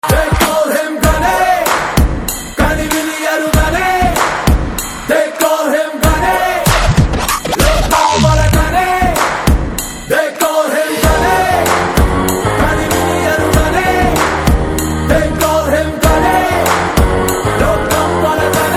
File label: Ringtone